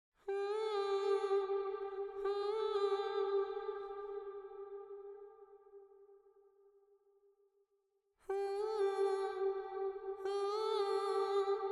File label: backing vox